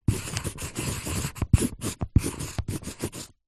office pencil artists thick writing on paper

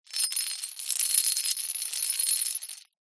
metal small chain drag concrete ground; foley

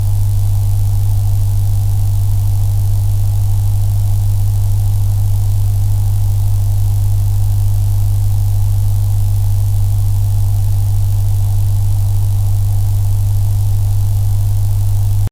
glitched tones Hum

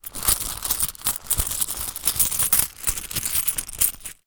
money coins in purse wallet rummage; zapsplat

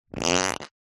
cartoon fart rasp

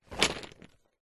jenga wood blocks tip from box onto carpet; leisure game

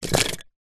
wood pieces handful small light thin set down; foley; zapsplat

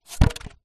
polystyrene piece drop ground; foley; zapsplat

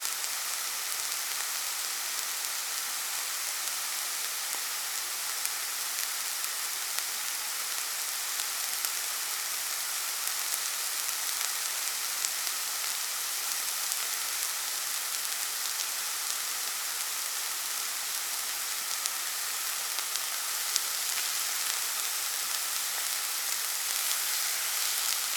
food vegetables frying in pan sizzle; zapsplat

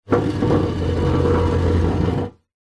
stool chair metal scrape drag wood deck; household

